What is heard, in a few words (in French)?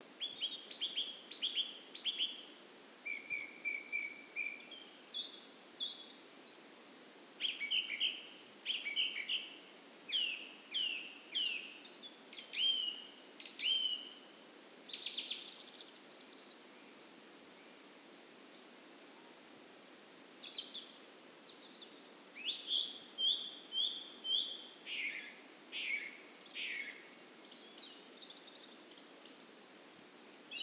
Grive musicienne; Issaux